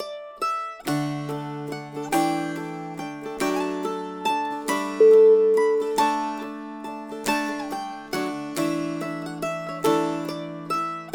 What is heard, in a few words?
acoustic irish ballad